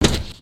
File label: tin, human step